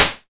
sizzle snare drum D key, acoustic snare sample tuned to the D note; snare drum samples